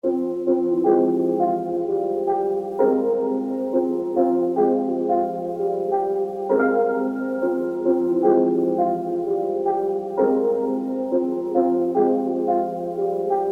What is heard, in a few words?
C Min BPM130